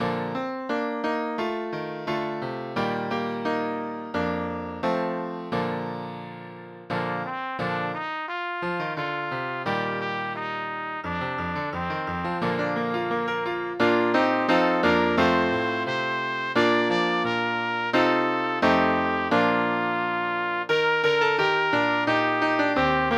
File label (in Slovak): Na Vysokom Sitne - pre trúbku a klavír